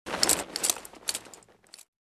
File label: Wear a metal belt